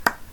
mouse Down click

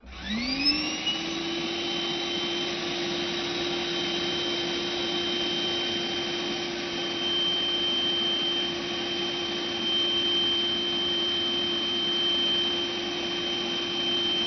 low pitch shifted vacuum cleaner

